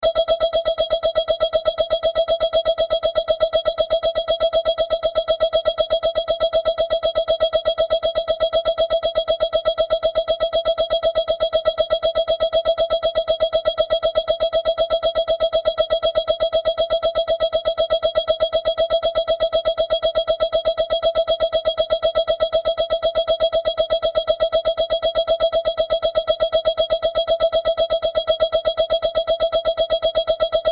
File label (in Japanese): pipipipipipipipipi, アラームや着信音; 30秒の音源データ; 朝起きる時のアラームや着信音